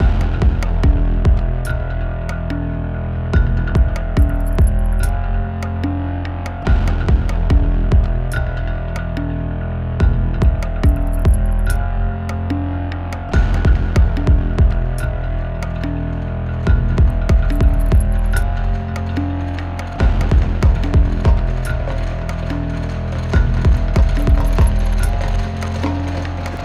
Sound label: Music Tension; Sifu; H2 New Level Area=PostPit